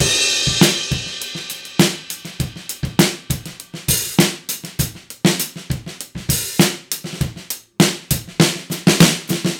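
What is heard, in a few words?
100 bpm, beat loops; Zero-G; Monster Beats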